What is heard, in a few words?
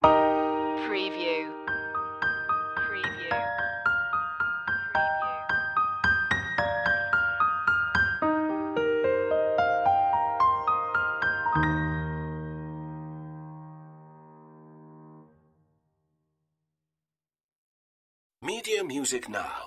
piano; the holly and the ivy; media music now